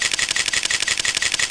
Shutter continue; KU990i Viewty

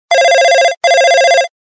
Old Ring; LG CB630

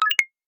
Camera QR Scan; Alcatel Pixi 3

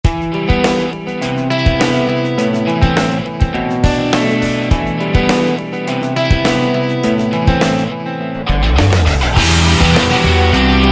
Burn away, Samsung phone tones

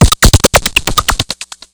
Beat Box, notifications; SKY IM-A830S VEGA Racer 2; Android

